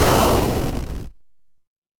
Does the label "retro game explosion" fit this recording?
Yes